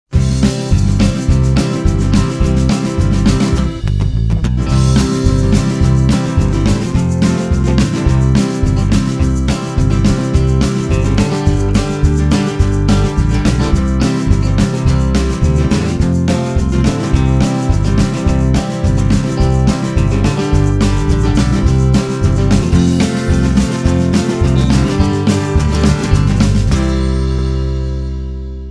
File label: Cane train song karaoke version; Wilmar Sugar; cane train safety; Train Brain Song